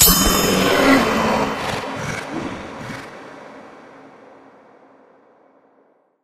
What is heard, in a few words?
medal click rare; ui; yeti